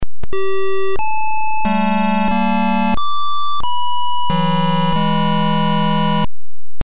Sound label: sad tune